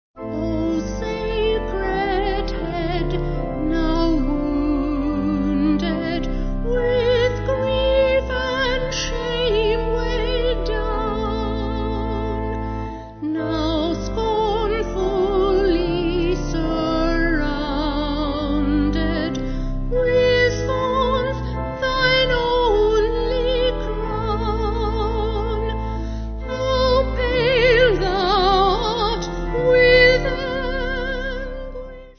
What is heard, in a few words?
Voice Organ